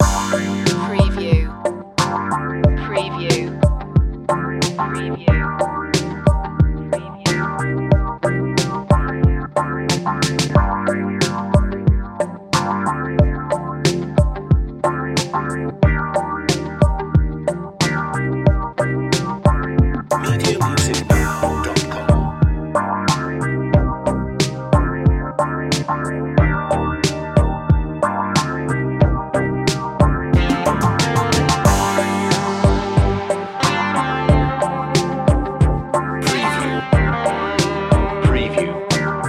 mellow retro

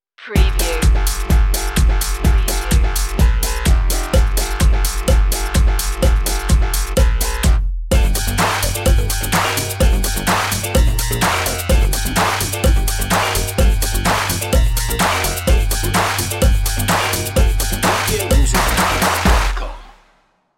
techno high jump

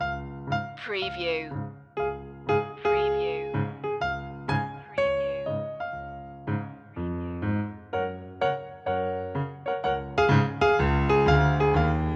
jingle bells piano loop long; media music now

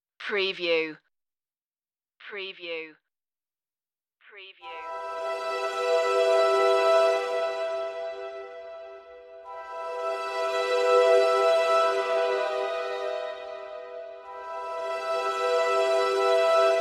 strings in a dark place no drones

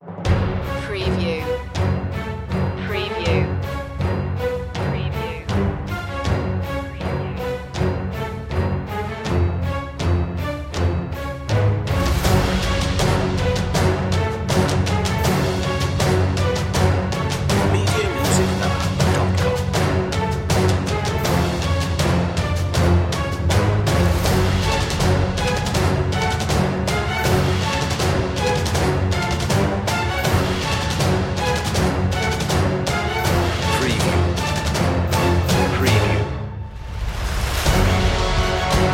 dragon heart no choir

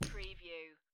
Poker Chips Place Stack Of Chips Gently On Felt

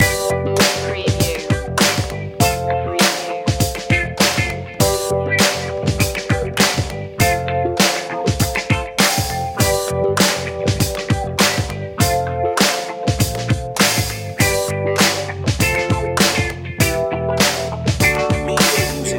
old school vibe loop

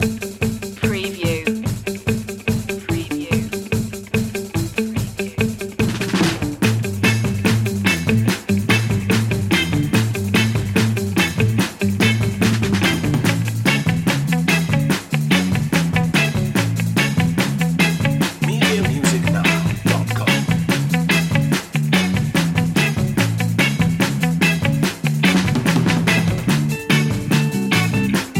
guitars bass and drums

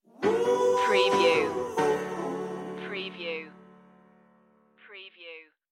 rainbow pop stinger

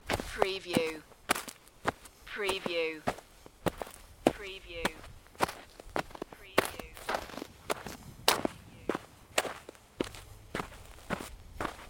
Snow and Ice, Footsteps snow hard packed

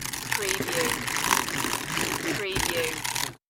Pencil Sharpener

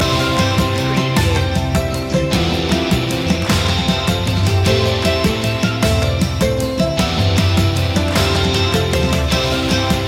shining through no vocals

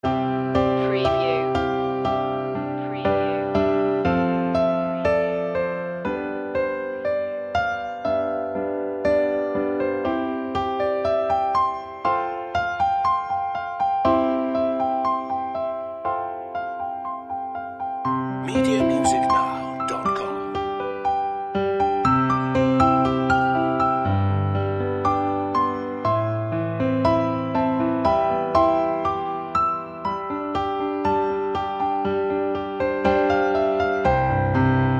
o come all ye faithful piano; media music now

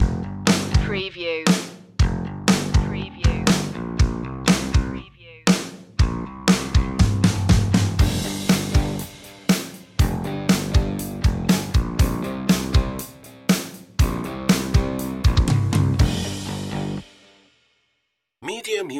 tough life stinger

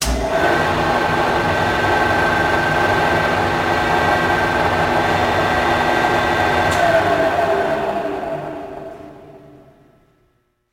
Mill Type 2

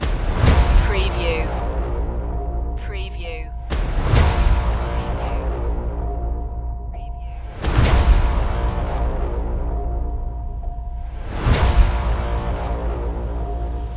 the haunting stinger

